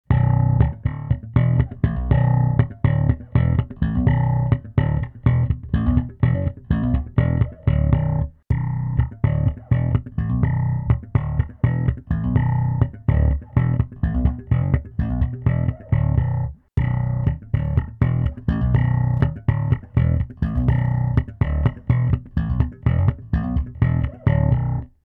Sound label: thump neutral horn off low high; Ashdown